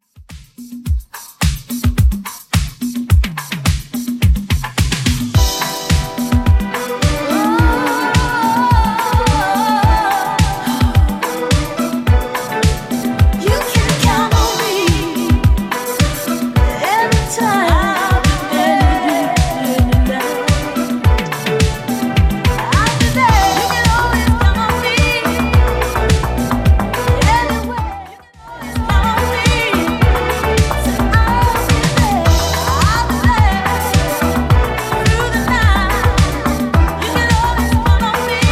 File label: 70s Redrum 107bpm